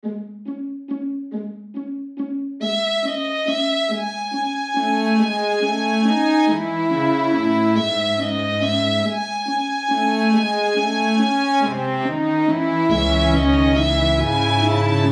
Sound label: the spring garden for strings